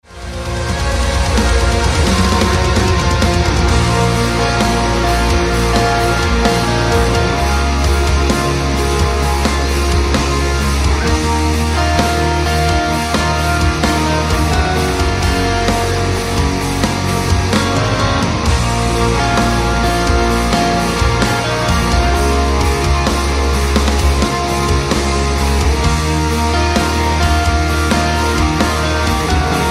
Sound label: Atmospheric Doom Metal